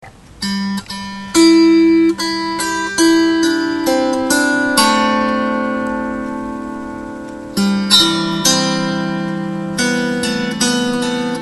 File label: Lo harp